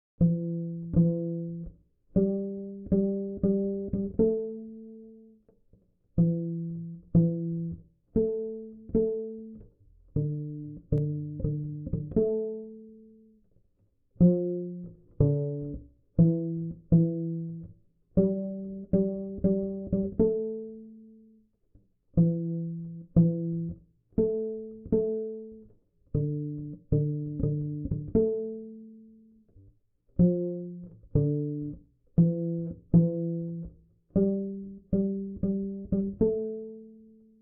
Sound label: falling bass chorus acoustic 3 times